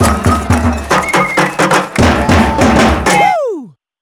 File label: Soccer Victory Dance; rayman raving rabbids playstation 2